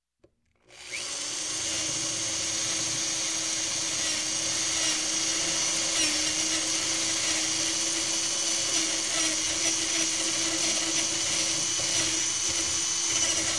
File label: Construction, Drill One Hole, Hand; Sound Ideas 2000 Series